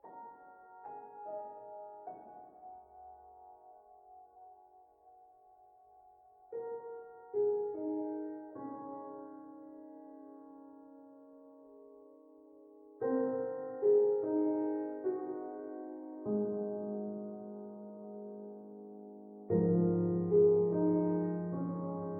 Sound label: intro, music; vignette; craft